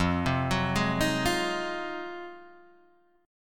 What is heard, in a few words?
F Minor 7th Flat 9th; Fm7-9 Chord {1 3 4 1 4 1} arpeggiated